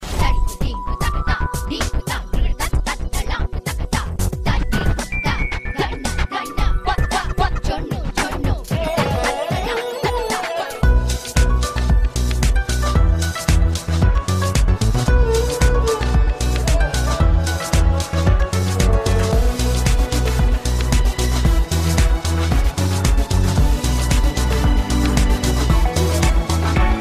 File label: classical dance tone